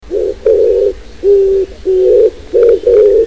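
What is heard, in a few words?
oriental turtle dove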